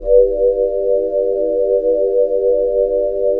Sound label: PAD